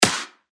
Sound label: Clap; Helix; revealed claps snares vol 2